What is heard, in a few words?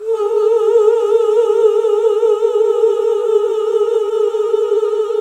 Gosp. Oooh; Sound & Vision - Gigapack II CD 1 (Roland); HAL Gosp. Oooh 1